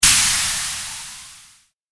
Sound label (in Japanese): 発射